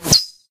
mh blade snick, manhack; Source Engine; video game; npc

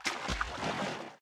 slosh, footsteps, walk

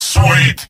scout cheers; mvm

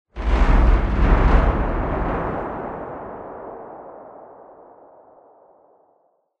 tone metal movement; video games; ambient; Source Engine; tf; lair

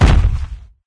tank walk, footsteps; player; source engine; left 4 dead 2

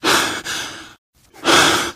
exertion minor, survivor voice, manager

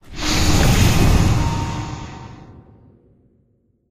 crusher open; Source Engine; Portal 2

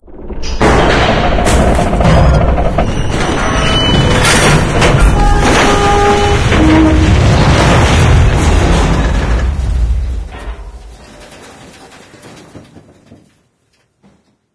train bridge collapse; ambient; source engine; ep2; outland

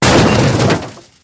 container crash; source engine; portal2

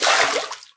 water wade, footsteps

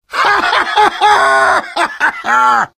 laugh happy; heavy; source engine; tf

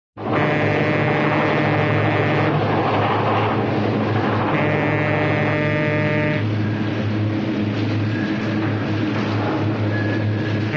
train, diesel constant running with horn; cartoon

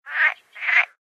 animal call frog; Source Engine; tf; lair

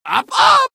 medic taunt flip exert; video games; source engine; taunts

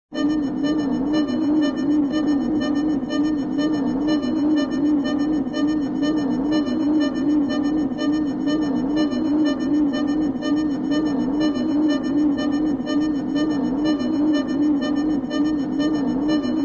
sci fi saucer, constant; cartoon

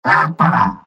positive vocalization; pyro; video games; source engine; tf; mvm

